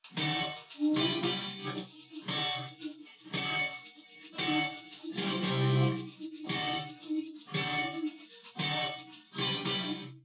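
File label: with drums, 380ms 4cm; SiSEC11; underdetermined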